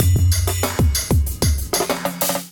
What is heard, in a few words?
Kit groove 95 bpm, Drum; MIDI Clips; Ableton; Drum Essentials